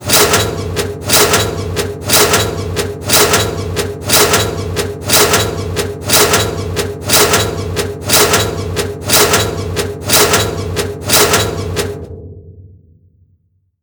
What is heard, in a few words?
Bucket Countdown